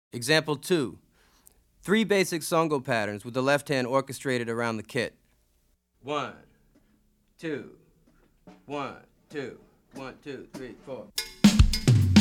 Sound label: Afro-Cuban Grooves For Bass And Drums